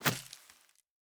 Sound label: Jump Grass; Opsive; UltimateCharacterController